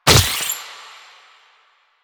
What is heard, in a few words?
grenade bounce; Warframe; Lasrian; Lasrian Soldiers; Weapons; Lasrian Goonadier Grenade